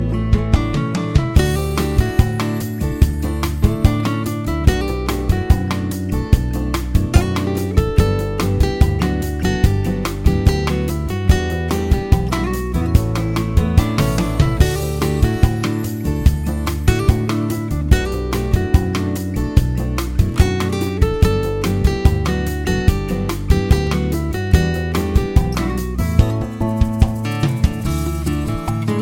bossa

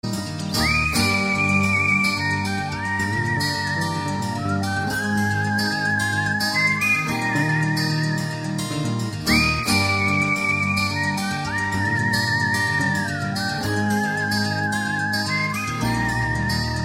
very cool whistle

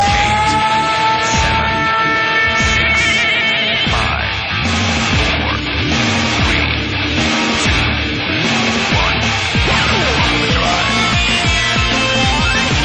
overdrive

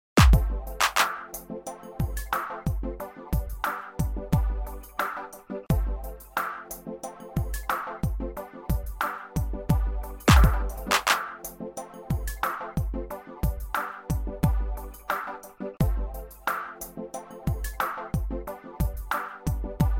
relaxing beat

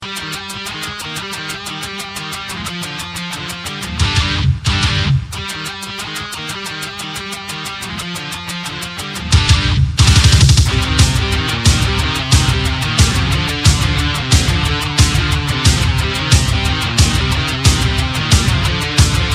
rock; megaman; bubble man

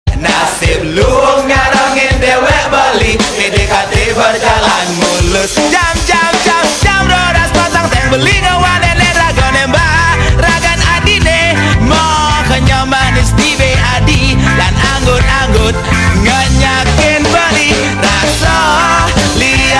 bali reggae

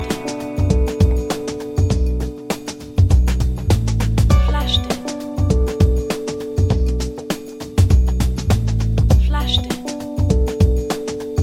jazzmatazz; ran

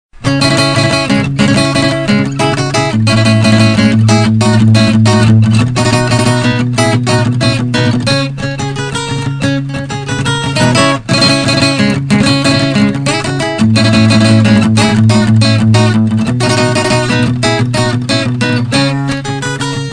acoustic; megaman

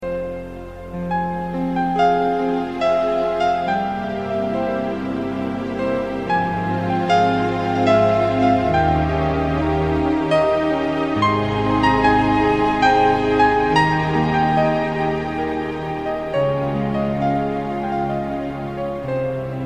sentimental piano

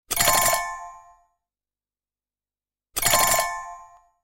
nokia lumia pinball

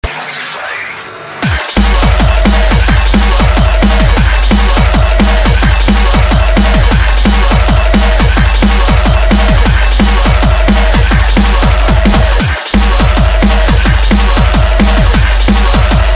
counter strike dnb

